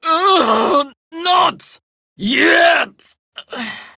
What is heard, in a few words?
Death Prime Grineer Female; Tau; Battle Barks